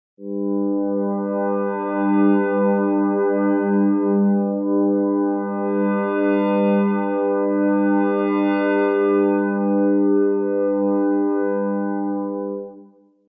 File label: Sci-Fi ringtones